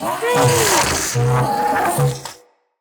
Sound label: Pain, Barks, Infested; Enemies; Ancient Shell